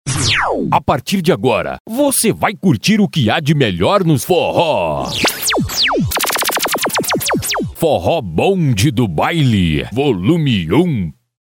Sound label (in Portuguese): abertura do forro